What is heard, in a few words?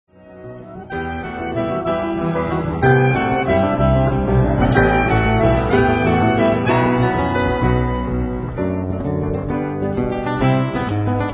Bourree; Bach